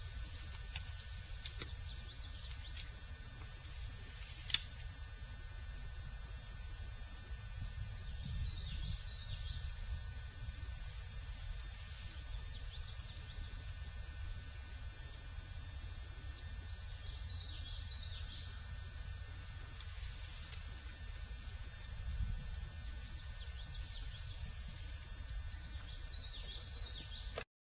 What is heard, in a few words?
woodpeckers